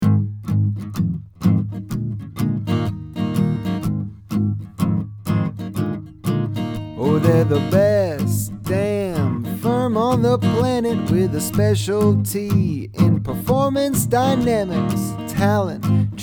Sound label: Jingle